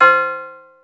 tubular Bell, Yamaha Motif ES drum machine; 300 Drum Machines; Yamaha Motif ES-Series

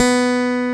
PICK B2; EL-GUT G

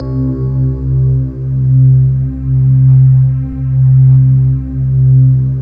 bell choir, slow bell choir; Optical Media International - Sonic Images Library

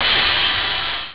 hihat open; Amiga Soundtracker sample

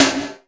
KLANK TOM, effected drum; Crypton - DrumBase5000

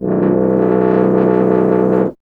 tuba; Miroslav Vitous - Solo Instruments VOL-2

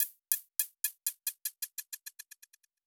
hihat pingpong; Polyend; Recue

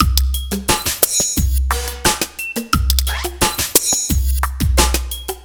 88 CAT LP 1, Industrial Loops

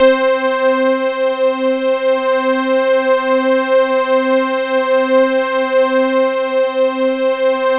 C4, PROPHET PAD; Sound Gallery - Charged

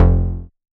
Bass, Synths, 5U Modular

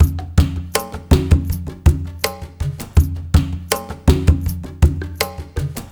PERCMX L, Liquid Grooves; Spectrasonics; Liquid Grooves 1 & 2; RESOSOUL

